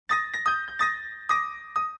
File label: piano lead D# E; Lounge & Reggae; Loopology; Be Bop Baby